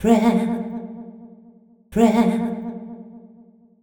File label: deep house female, 125, A, vocals